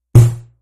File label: alert